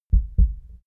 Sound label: heart beat double; koerpergeraeusche